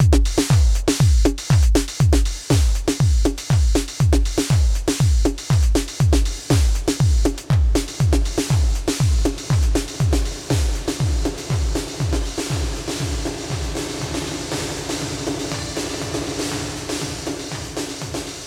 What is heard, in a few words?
Queen Of Pentacles Plate Reverb